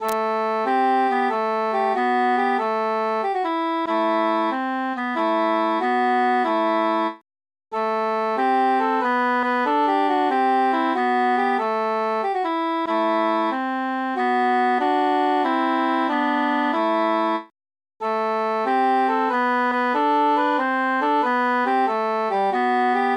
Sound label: alle psallite transposed altus mute